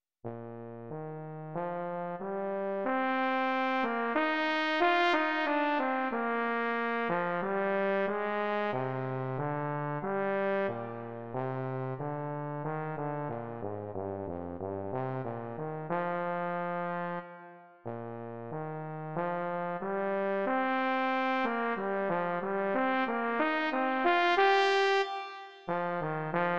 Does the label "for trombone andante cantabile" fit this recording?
Yes